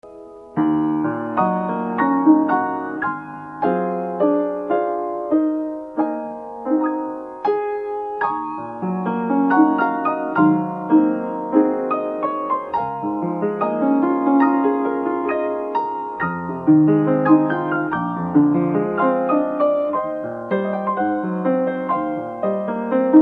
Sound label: piano